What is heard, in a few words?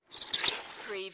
Bicycle Skid